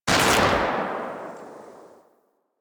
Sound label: Rifle Shot; Warframe; Weapons; Thanotech